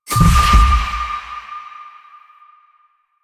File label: Ring Success; Warframe; Jade Shadows; Quests; Birth Minigame